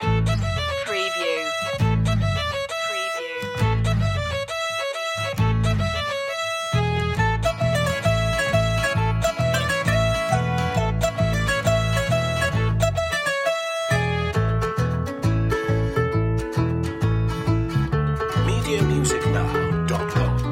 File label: old irish tavern no drums